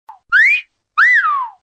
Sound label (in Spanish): silbido coqueto